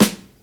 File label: trebly steel snare drum sample g sharp key, snare one shot tuned to the G#